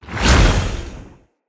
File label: Staff Caster Cast Short; Weapons; Staves; Dendrit Staff Caster